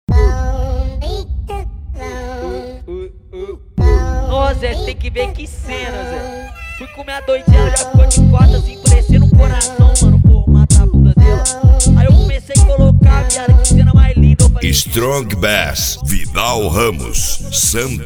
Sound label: strong bass